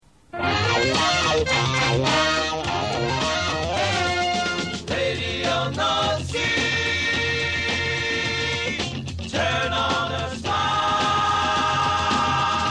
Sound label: jingles